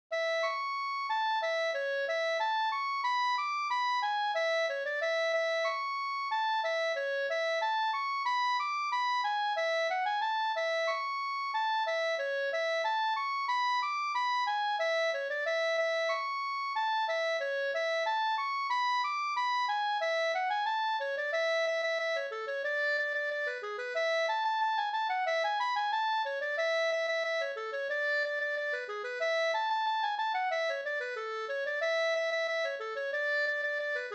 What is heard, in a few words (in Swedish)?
anglas